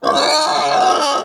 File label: Hog Death